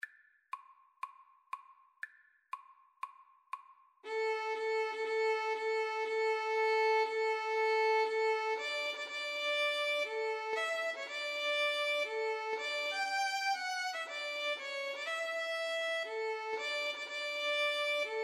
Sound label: wedding march, Violin Guitar Duet